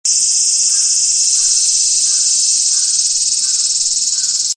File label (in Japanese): aburazemi